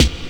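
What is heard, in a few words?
snare processed, Disco House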